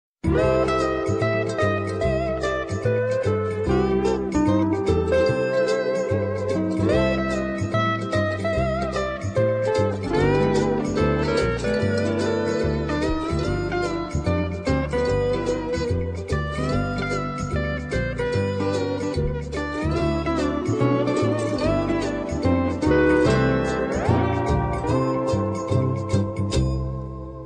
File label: Hula Hawaiian